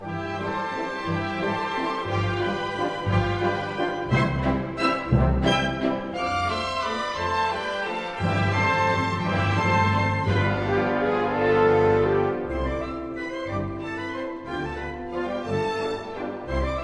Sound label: Tempo di Valse; Tausendundeine Nacht Op. 346